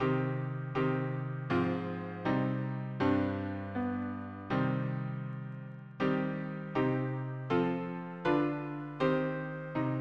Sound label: harmony piano